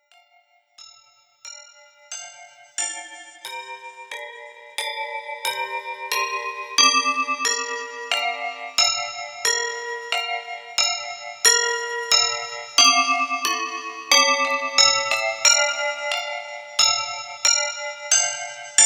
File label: Horrible Musicbox; Silent Horror Original Soundtrack